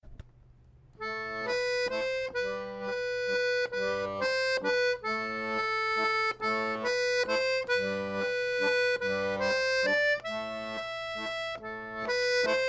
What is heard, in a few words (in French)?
valse; rassemblement-ateliers; valse du soir